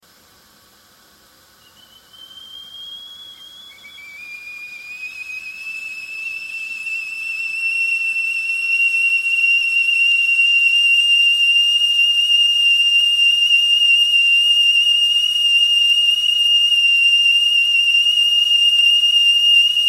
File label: whistling kettle